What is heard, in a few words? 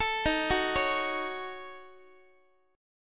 Eb Minor 7th Flat 5th, Guitar; arpeggiated